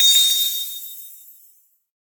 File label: item allocation